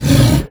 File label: minotaur attack; Crypt of the NecroDancer